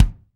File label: BD, BD REAL